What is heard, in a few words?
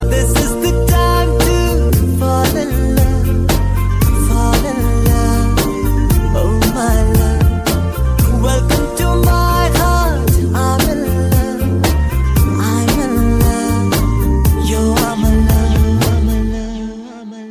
song, ringtones; blues